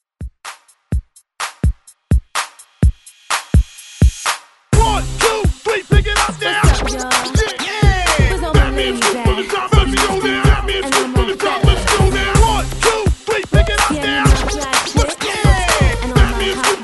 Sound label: Hype ReDrum Scratch 126 Bpm